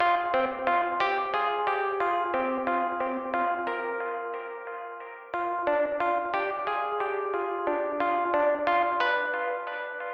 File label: Rhodes; Toki Tori 2